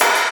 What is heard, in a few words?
clap one shot a sharp key, Loudest frequency: 3086Hz; single hits one shots